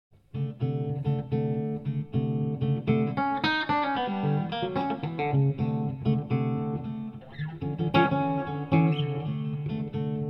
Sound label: Samba